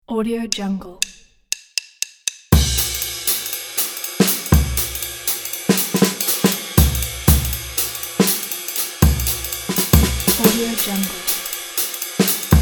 jazz drum solo classical style

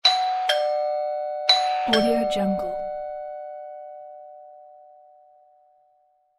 door bell ding twice

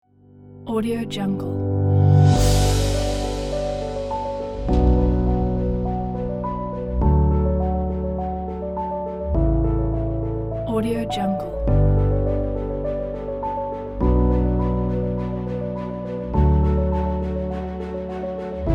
uplifting epic cinematic

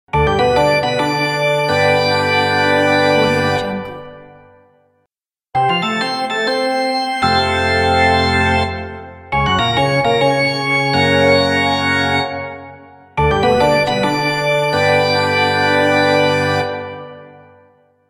organ charge fanfare; audiomonkery

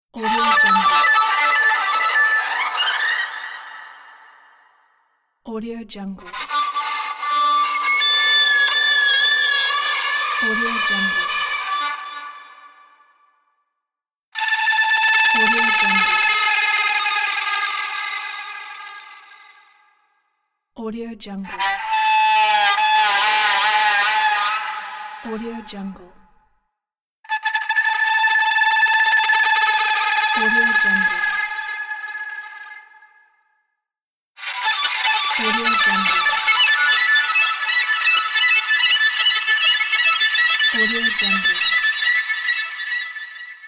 horror suspense pack

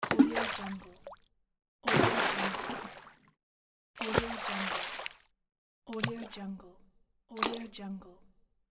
water splash

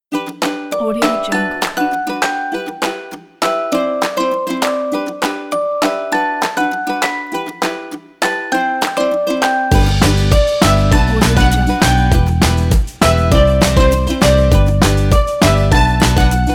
upbeat happy

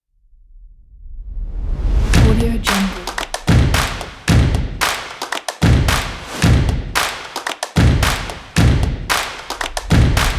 stomps and claps short percussion

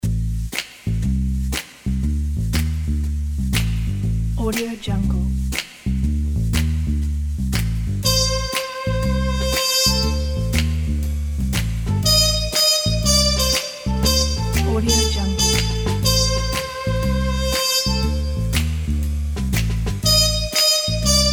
mystery swing

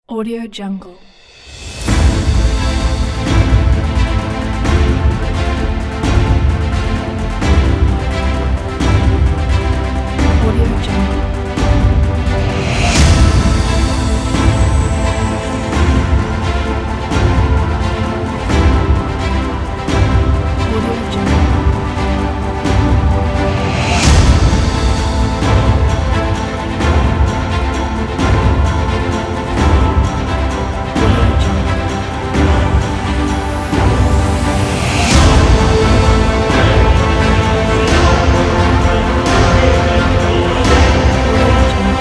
epic

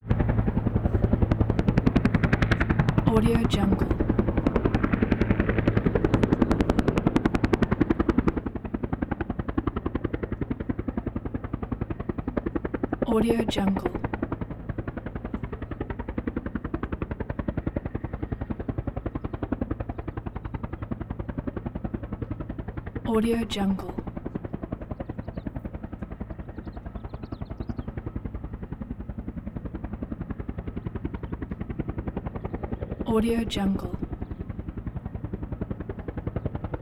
helicopter hover whirring blades chopping; prosoundfx

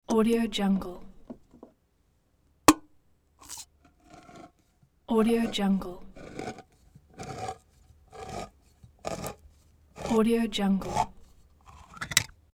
can opener manual; hollywood edge

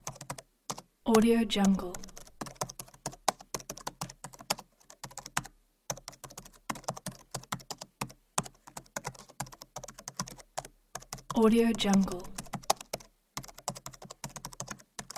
keyboard typing sound

